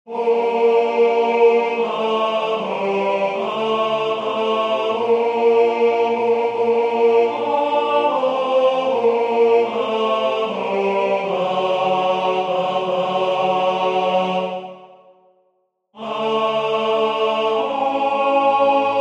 tenore; Jesu Rex admirabilis Palestrina

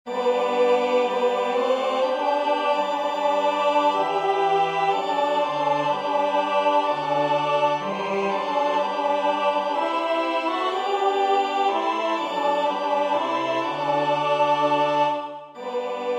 tenor; Tollite hostias

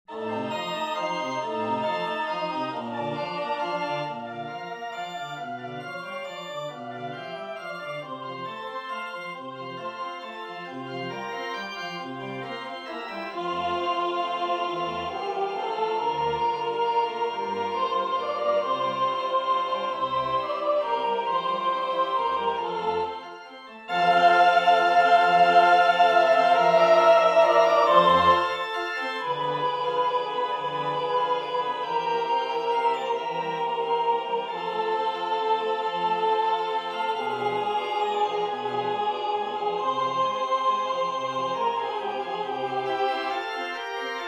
Laudate Dominum soprane a la mesure; Mozart

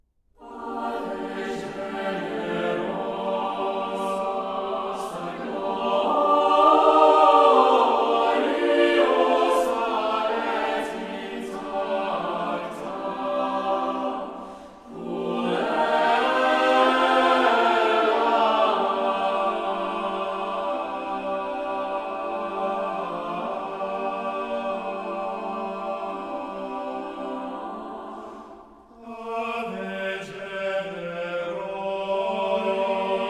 Ave generosa simplifie

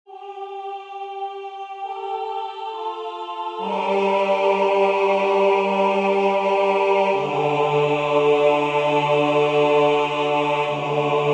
Christus factus est basse; Anerio

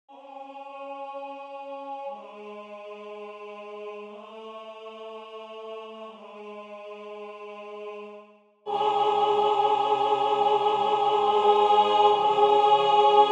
Ecce Homo altus

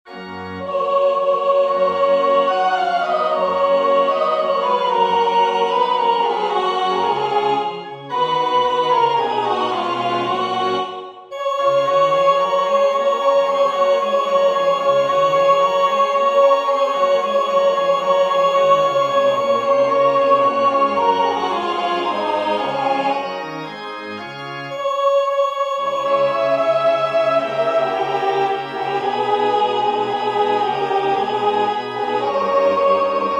soprane; Confitemini Domino Haydn

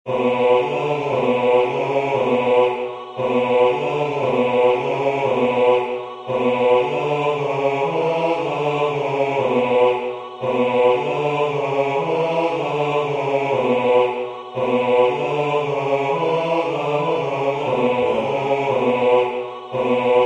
Alle psallite cum luia basse

